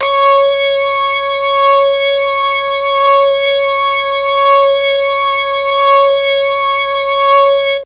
C#4 ORGAN, Mellotron MKII ORGAN; Mike Pinder - Mellotron Archive CD

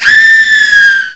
SCREAM, HUMANS; M8; CMI Factory

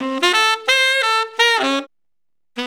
JZ 90 SAX F, ACID JAZZ; Best Service; Advanced Media Trax Modern Composer

